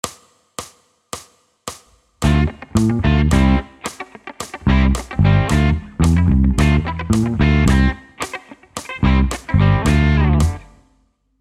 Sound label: Groove Improvisation Funk